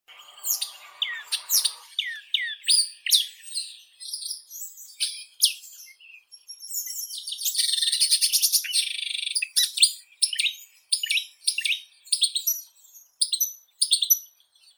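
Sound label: marsh warbler, Acrocephalus palustris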